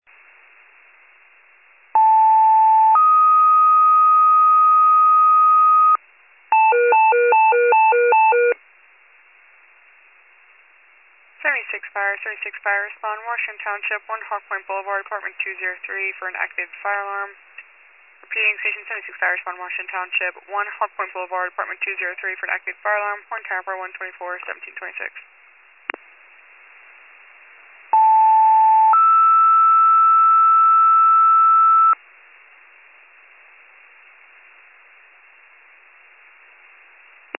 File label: fire, pager alert